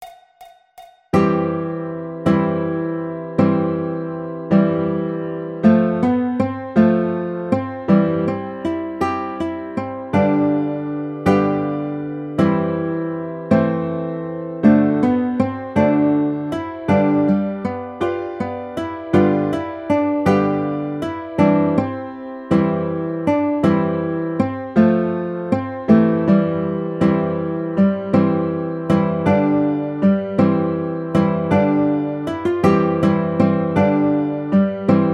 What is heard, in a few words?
Baritone, ukulele